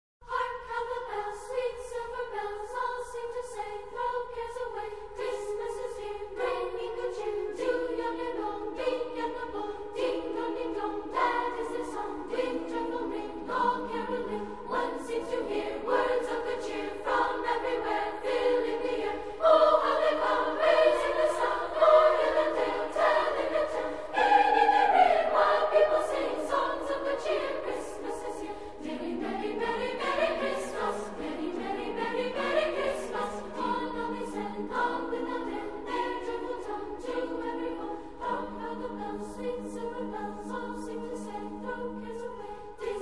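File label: Real, 160 bpm; Carol of the Bells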